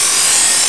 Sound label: SAW1